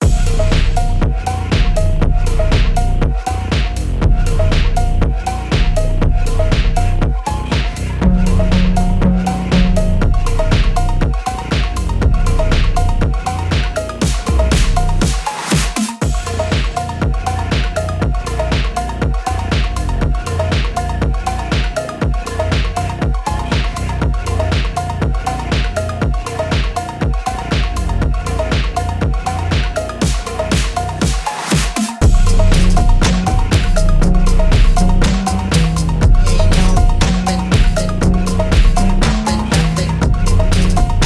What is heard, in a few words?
madness phonk